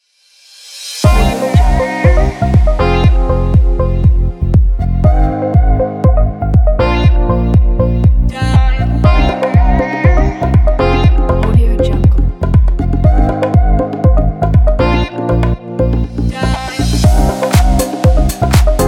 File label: fashion house minimal; nicebeats